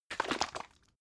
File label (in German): bottle syrup shake single, gastronomie